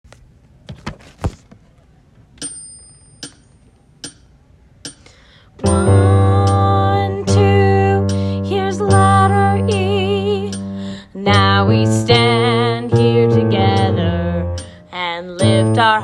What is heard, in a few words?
bass